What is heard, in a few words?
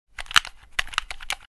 Joystick buttons press multiple times quick